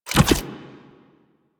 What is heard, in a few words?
Fan Energy Trench Activate Attach; Warframe; Protea